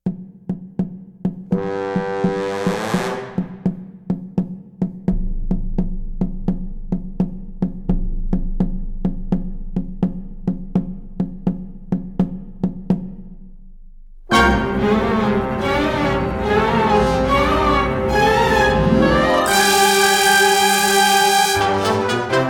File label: pirate march